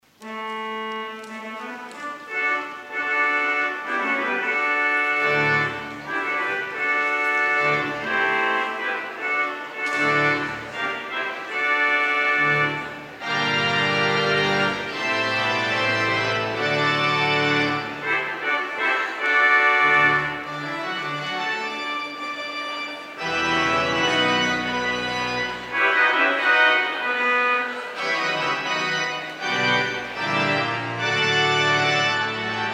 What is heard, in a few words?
Fanfare, organ